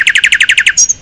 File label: Bird Sound